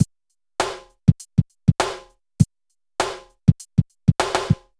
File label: BPM100 SYN; AKAI S-Series CD-ROM Sound Library VOL-4